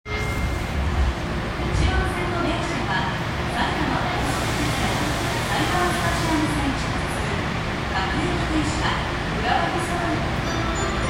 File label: train sounds; 南北線麻布十番北